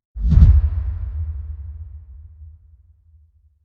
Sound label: Whoosh; FRANCE3; PETANQUE; HABILLAGE